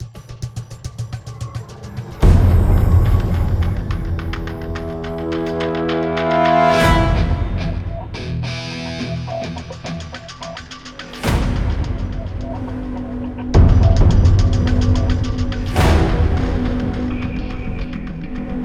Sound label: Intro Signal